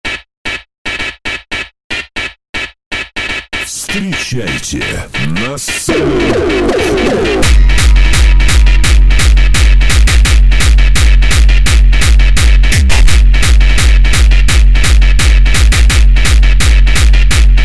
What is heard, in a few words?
funk